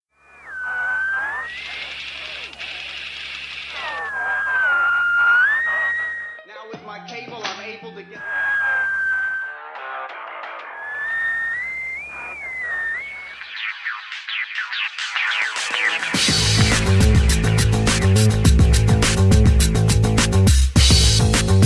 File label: intro music